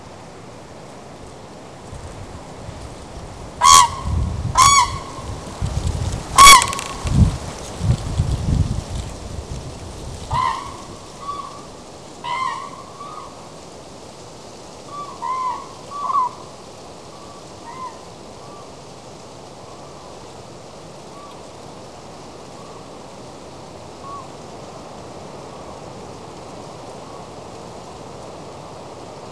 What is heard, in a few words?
crane sp series; Lingshan; Beijing; nocturnal flight calls (NFCs)